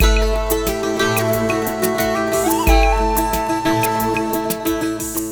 World, ringtones; Eastern Sky; Google; NewWave Labs

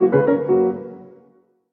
changing keys, Classical Harmonies notifications; Google; Google Sounds

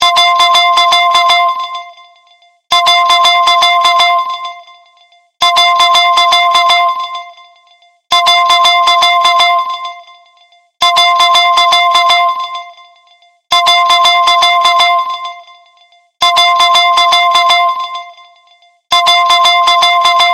Simple Beep

